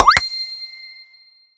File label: Notification Appear